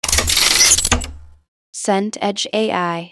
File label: window sash open